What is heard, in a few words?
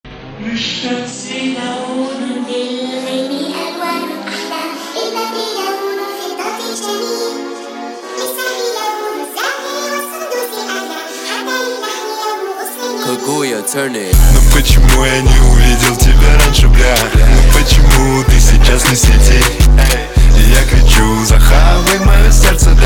808 arabic; pop